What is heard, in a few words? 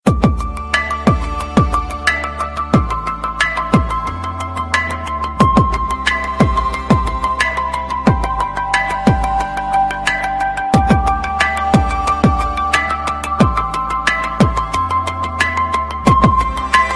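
blackberry, Ringtone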